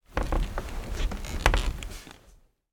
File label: household bunk bed movement top bunk recorded underneath